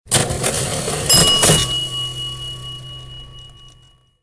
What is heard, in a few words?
Cash Register Sms